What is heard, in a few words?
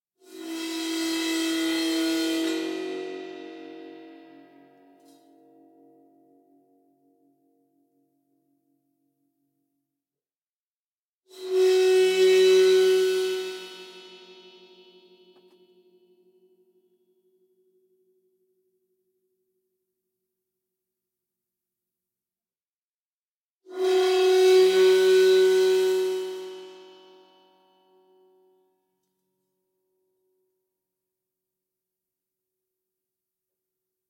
Instrument Cymbal Bowing Low Pitch Ringing OmniMic; Guild Wars 2 (Original Foley Soundtrack)